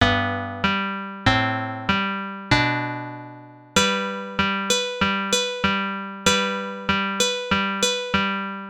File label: intro half speed